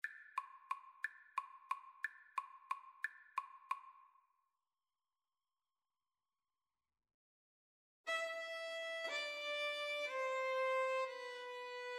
carol of the bells, Violin Flute Duet

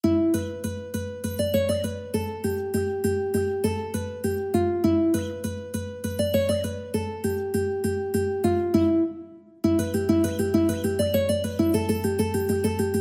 Debka Chaim, ukulele

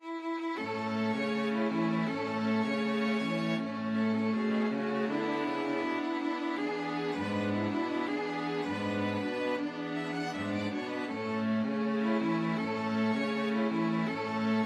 string quartet

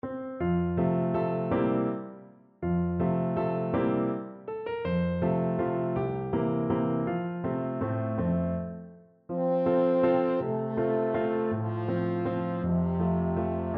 french horn

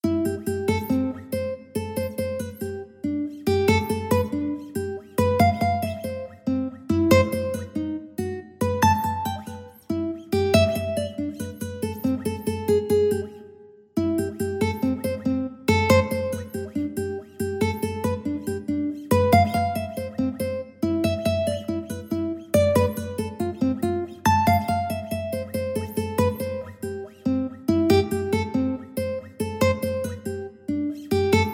ukulele

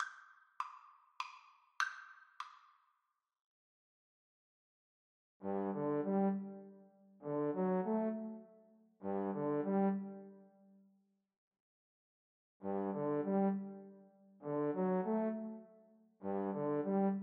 fur elise, Trombone Duet

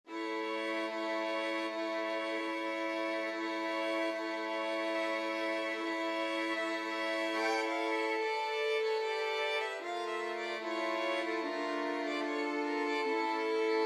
romanian folkdance, violin quartet; bartok

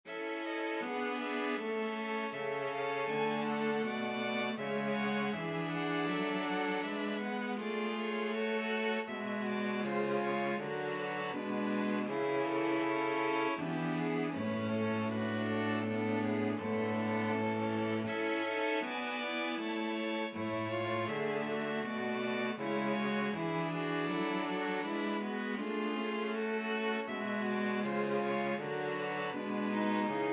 STRQ; brahms es ist ein ros; string quartet

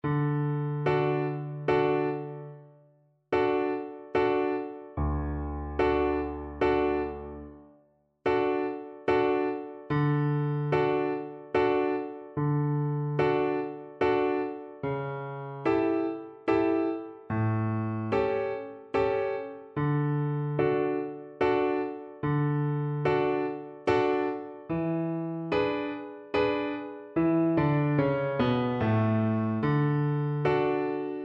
saint saens elephant viola

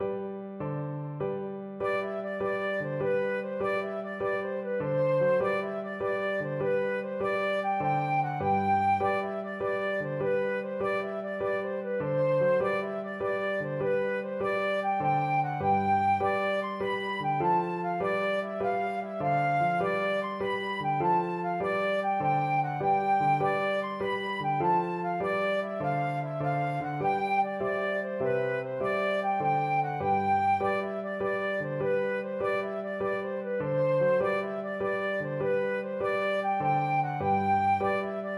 another jig, flute

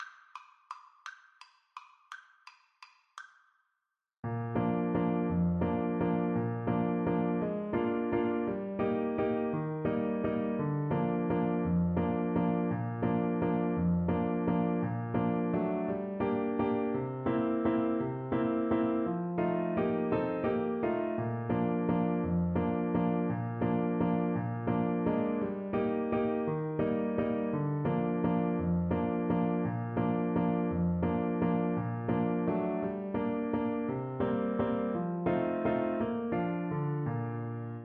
Trombone

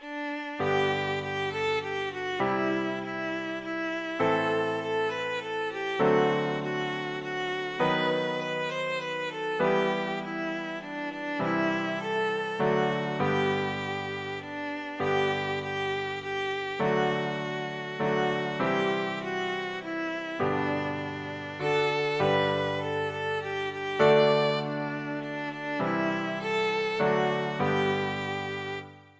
we wish you a merry christmas, violin

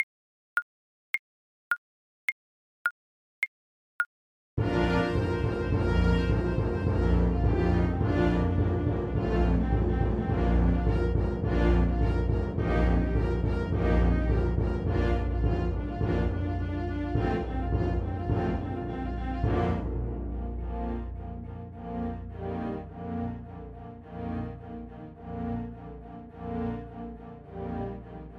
Capriccio Espagnol ASAX

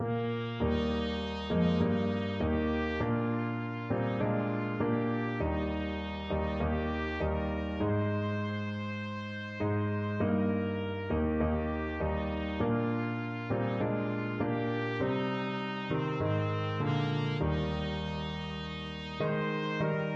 auld lang syne, trumpet

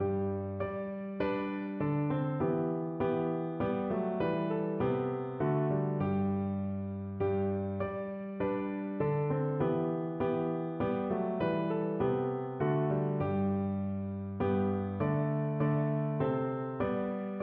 Christmas Hymn, piano

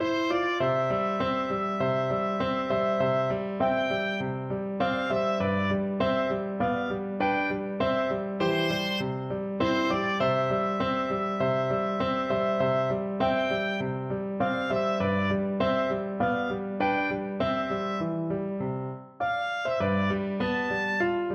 tiritomba, oboe

